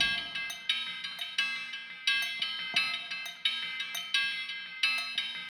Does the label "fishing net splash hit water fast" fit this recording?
No